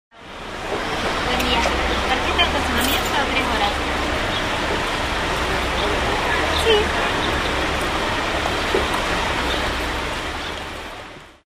calles peatonal cordoba y mitre; Rosario

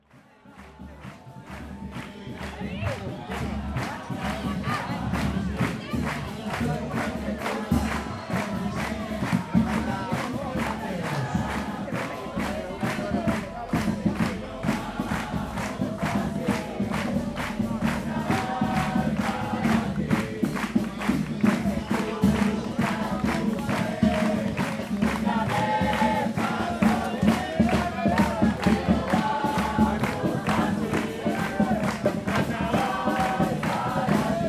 carnaval murga; los vecinos recontentos

